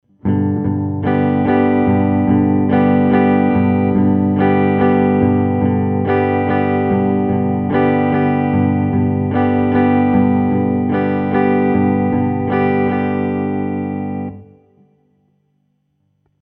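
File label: Basic Right Hand Strum Pattern, guitar; introductory guitar skills worksheets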